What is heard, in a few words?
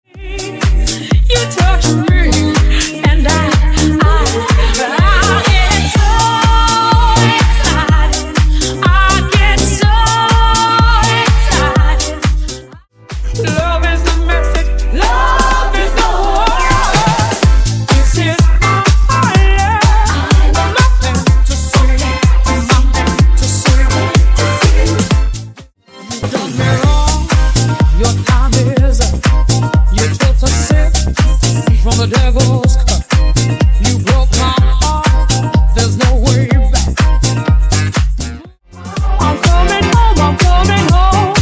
Disko Funk